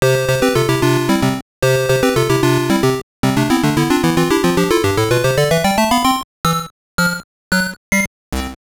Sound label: stage completed; Hat Sale; Hatris; GB